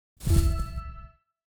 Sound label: Shopping Cart Cleared; Blutag; Dreamr